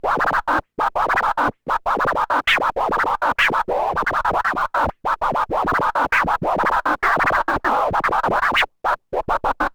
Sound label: Scratch; Matt Hoffman's Pro BMX 2; XBOX